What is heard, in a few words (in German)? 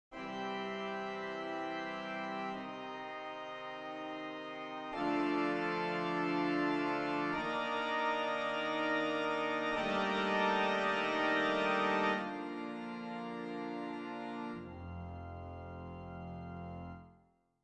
Orgel; Parteienklänge